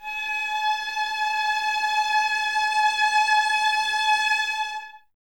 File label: violas A 5, String Ensembles, Exprsive; Miroslav Vitous